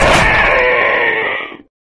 combat body destroyed; flood

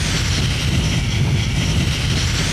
flying, weapons; serioussam2

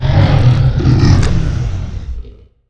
idle, dragon

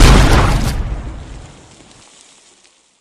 pop; flood; carrier; predatorcz; halo

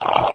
pain, flood

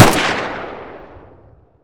famas fire; weapons

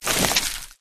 human form short move; flood

